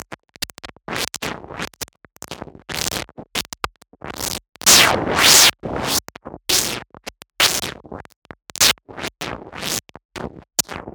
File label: Bad Radio Noise Processed